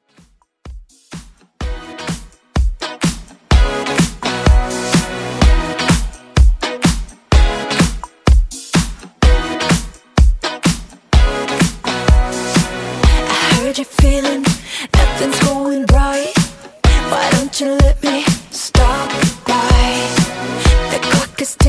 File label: Dj intro outro, 126Bpm